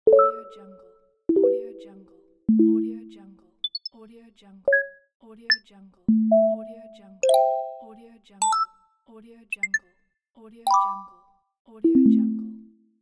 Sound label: interface